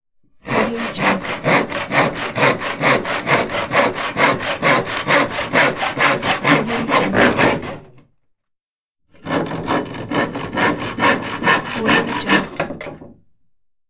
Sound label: sawing wood